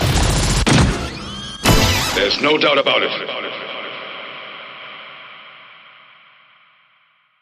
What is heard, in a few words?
Sweep